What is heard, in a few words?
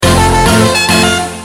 Bilhorn; meloboom